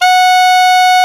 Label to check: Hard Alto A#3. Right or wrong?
Right